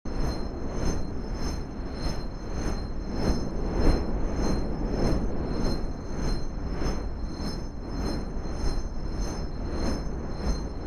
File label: Shining Whirling Spin Loop